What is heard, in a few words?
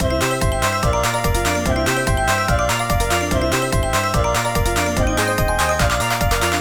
Bgm bonus time; king common